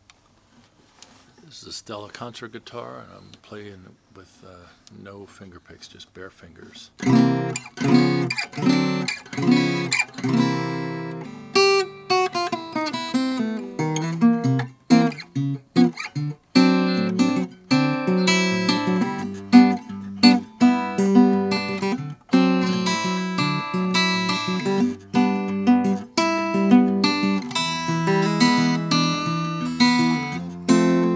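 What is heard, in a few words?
oscar schmidt stella natural, Guitars; 1920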